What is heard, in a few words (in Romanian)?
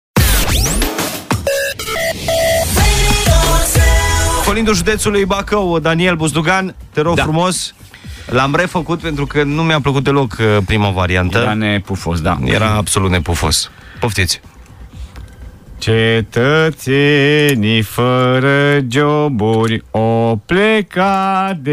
Colind Bacau, colindele pe județe